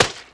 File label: splat paintball Hit